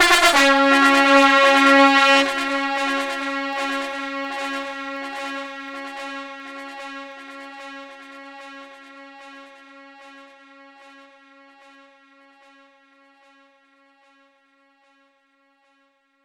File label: bam bam horn; Horns